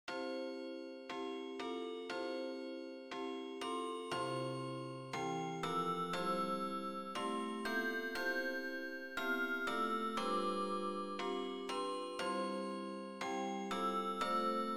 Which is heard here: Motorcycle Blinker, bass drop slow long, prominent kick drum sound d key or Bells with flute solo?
Bells with flute solo